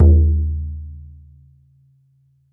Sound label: BODHRAN; E-MU Producer Series Vol. 7 – Old World Instruments